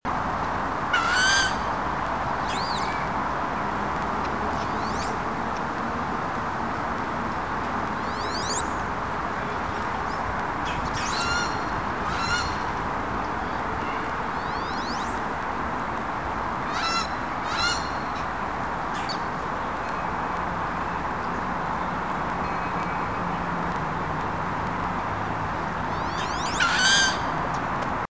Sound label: duck wood; Birds; Ducks